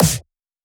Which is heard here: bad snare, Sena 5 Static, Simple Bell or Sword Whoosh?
bad snare